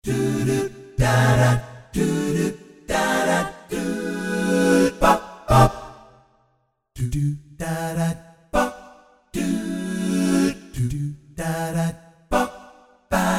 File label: Jazz Combo; Spectrasonics Vocal Planet; Jazz Group Multisamples